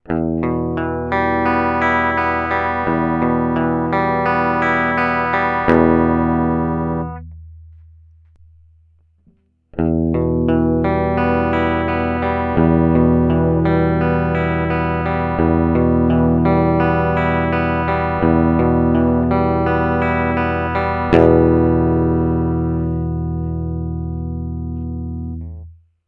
Mooer Tone Capturer guitar Target Guitar Captured

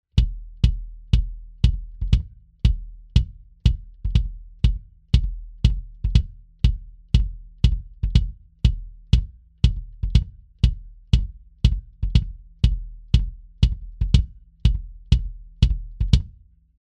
V-Kick Mitte Modern Classic; sE Electronics

